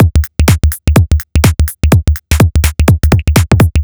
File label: 125bpm, House Drumloops